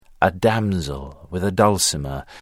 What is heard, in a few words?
damsel dulcimer